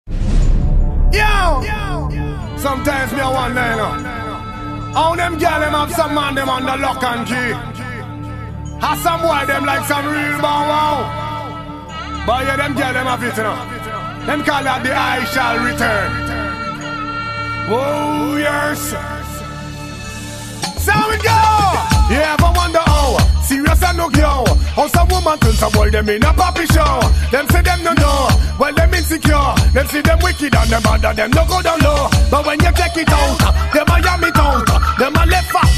oriental riddim

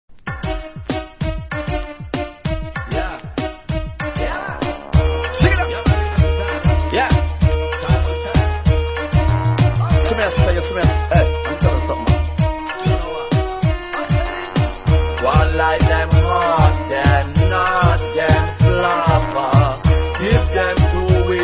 the banjo riddim

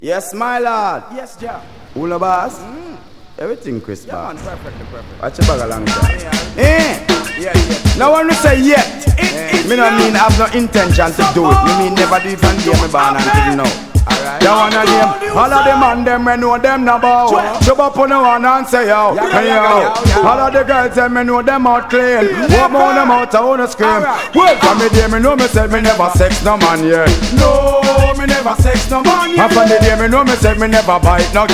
thunderstorm riddim

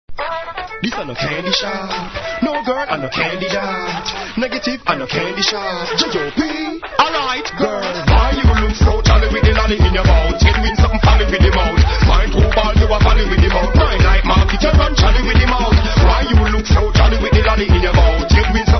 irish dance riddim

